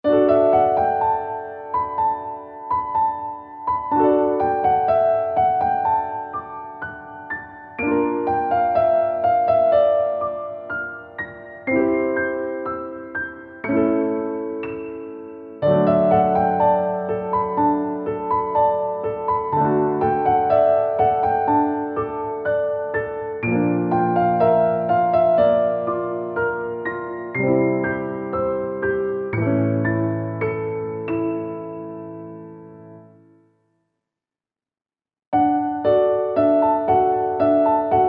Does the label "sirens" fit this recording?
No